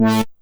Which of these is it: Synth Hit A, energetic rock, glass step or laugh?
Synth Hit A